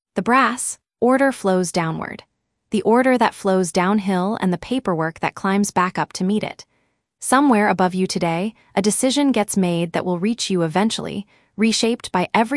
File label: the brass upright; law enforcement